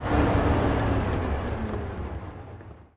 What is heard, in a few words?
engines; World of Tanks